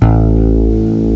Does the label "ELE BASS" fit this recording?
Yes